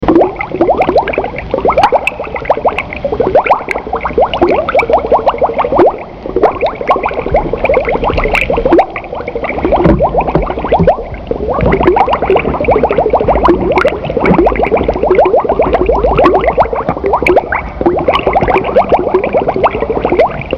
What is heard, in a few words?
Bubbling, Water; weather; Ringtones